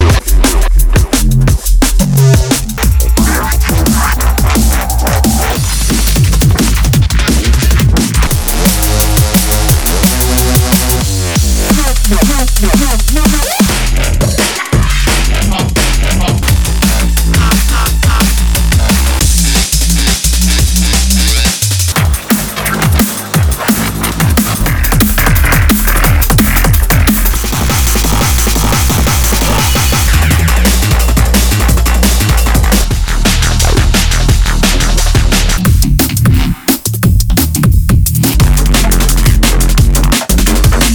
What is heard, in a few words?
DNB Neurofunk; CoolRockBassBlock; 42 DNB Neurofunk Tracks in 1m55s